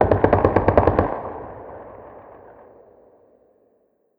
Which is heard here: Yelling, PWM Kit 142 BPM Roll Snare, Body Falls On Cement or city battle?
city battle